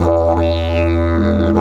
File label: E 150, DIDGE RIFFS